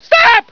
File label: scream, scientist